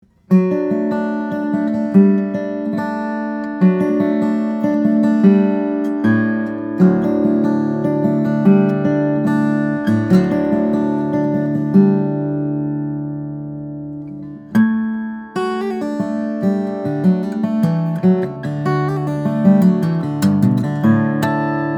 Super Parlor, guitars, steel-string guitars, flattop; true north; model 3; brazilian rosewood; swiss moonspruce